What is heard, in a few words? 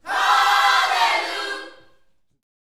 YOUTH CH PHRASE, Vocal Planet Gospel; Spectrasonics; Vocal Planet Vol.1 Gospel